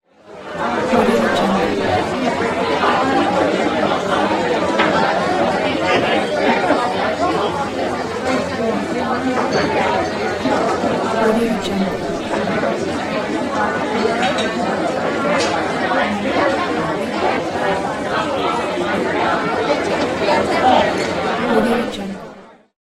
bar crowd

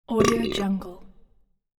low and wet belch

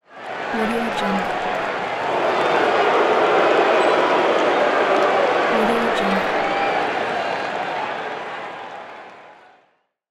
crowd screams boo

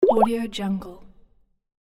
water drop bloop

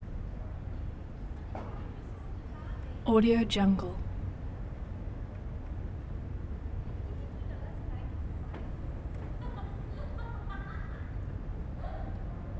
hotel lobby; prosoundfx

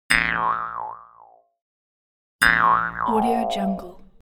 cartoon percussive mouth harp

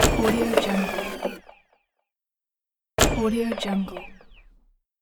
user interface mechanical error; inspectorj

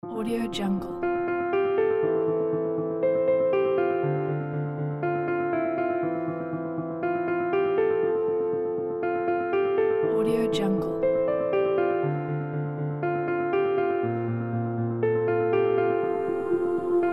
romantic moments; musicare

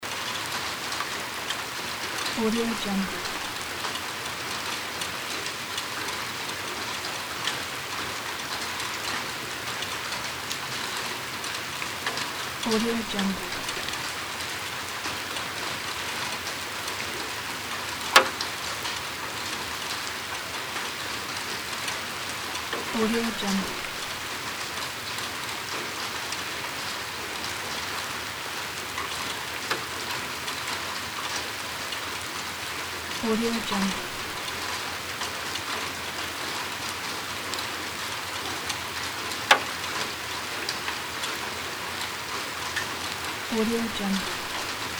rain

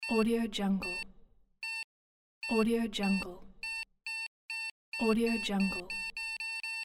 heart monitor beep

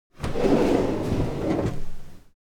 office closing drawer; foley; smartsoundfx

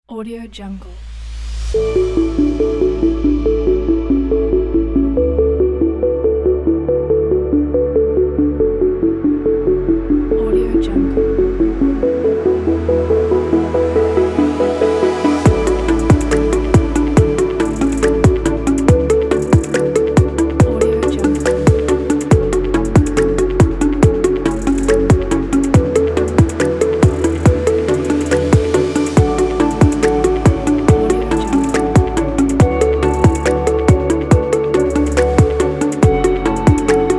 pop ambient background

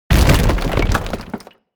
object break stone medium

